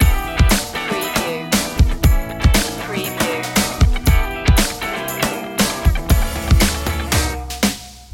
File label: the funky one loop